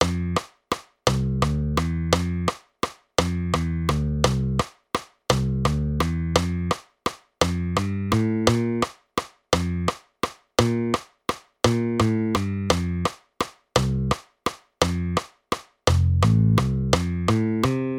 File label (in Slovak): Basove figury valcik